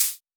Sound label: hat, hithat